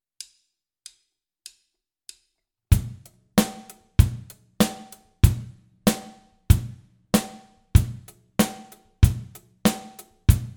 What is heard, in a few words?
Grooves; Bum Bum Tschack 1